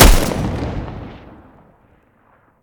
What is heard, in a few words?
mg muzzle mono, weapons stationar